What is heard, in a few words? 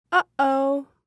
fail